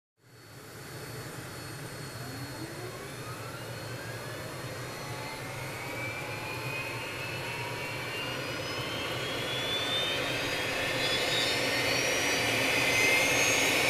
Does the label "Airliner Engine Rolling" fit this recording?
Yes